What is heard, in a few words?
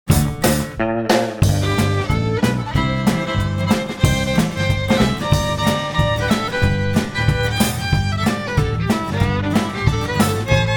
Plays CW Bass